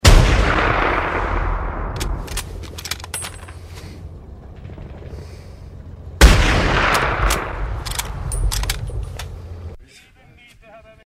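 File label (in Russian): snaiper vintovka, sniper rifle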